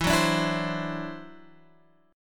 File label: E 7th Suspended 2nd Sharp 5th, E, down